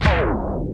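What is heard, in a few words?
percussion; ZERO-G; Datafile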